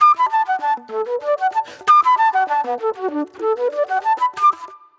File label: Pop Flute; Sonic Foundry (Sony Creative Software); Manhattan Flutes; Slinky's Place